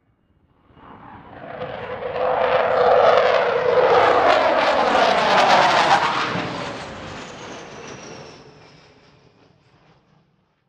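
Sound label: Plane Dh110 Sea Vixen overhead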